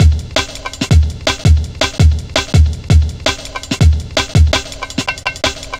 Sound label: Drum Loops, 165.5, Jungle